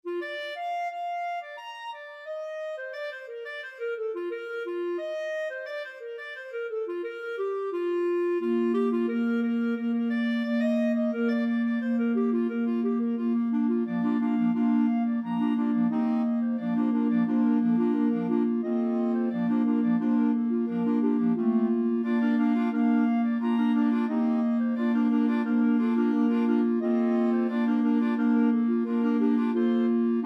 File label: 5 clarinet, clarinet choir